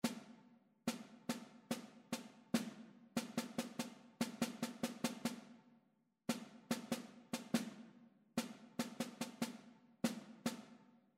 rhythmic error detection

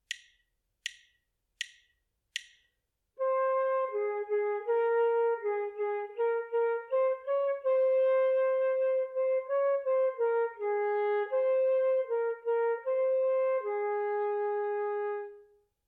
melodic dictation

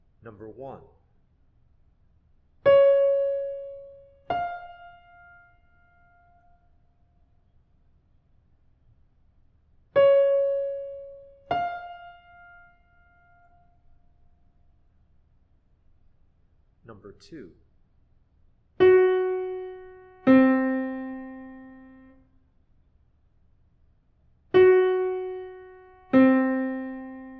intervals

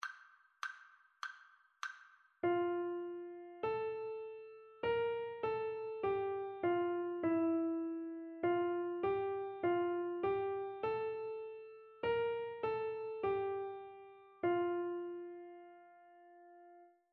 rhythmic dictation

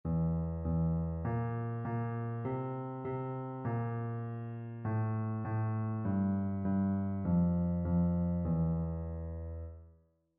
mode identification

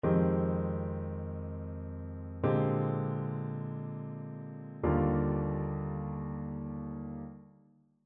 ii V I progressions; ear training

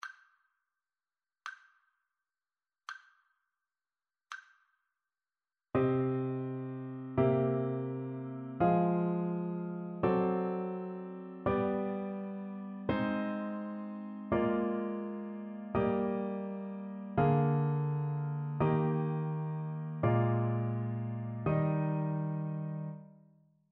harmonic dictation

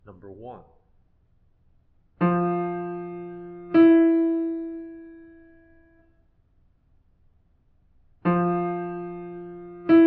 intervals

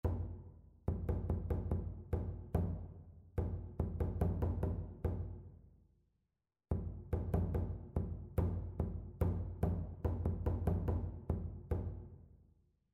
rhythmic error detection; ear training

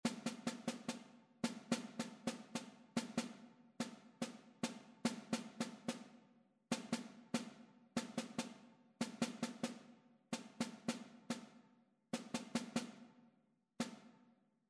rhythmic error detection

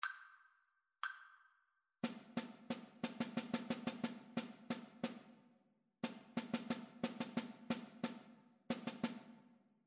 rhythmic dictation

